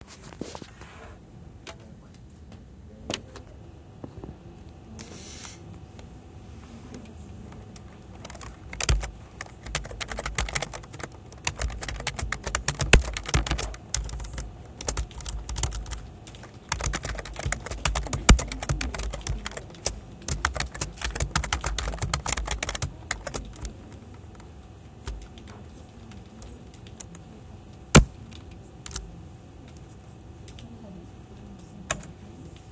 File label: keyboard